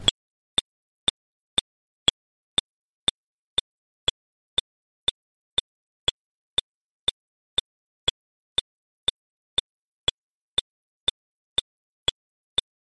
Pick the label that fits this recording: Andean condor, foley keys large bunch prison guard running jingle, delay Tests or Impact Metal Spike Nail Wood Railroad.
delay Tests